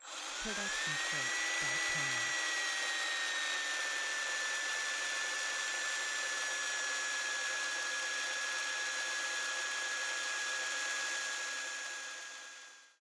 drill fast long; SoundsCrate

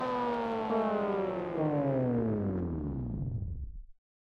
vinyl stop spin down; soundscrate

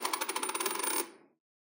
coin spin to stop; soundscrate

